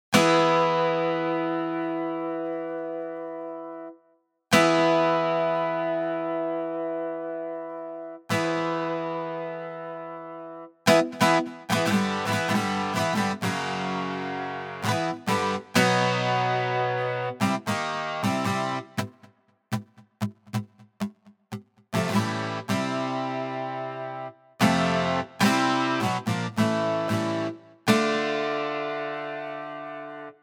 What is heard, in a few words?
Strum GS